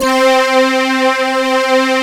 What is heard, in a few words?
ELG STR C4, ELE STR